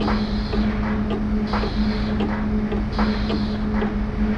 clock

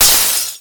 potion

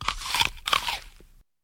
eat

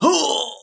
die man

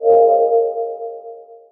halo

